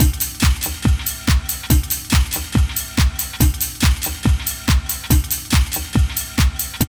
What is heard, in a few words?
LOOP, Tekno Industrial; East West